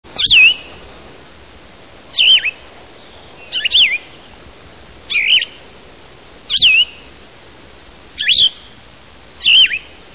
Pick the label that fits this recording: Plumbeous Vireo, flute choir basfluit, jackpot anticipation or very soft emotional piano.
Plumbeous Vireo